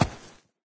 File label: foot; Garry's Mod; hl2